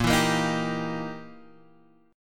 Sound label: E Minor Major 7th Flat 5th A sharp